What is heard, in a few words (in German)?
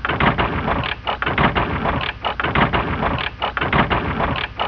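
fliessband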